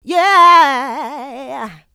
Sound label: Vocals, Adlibs; Houseworx